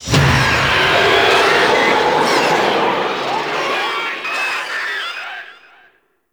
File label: Zoo Wave Pain Stinger; Warframe; NineteenNinetyNine; Stage10TheZoo